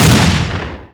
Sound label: colt fire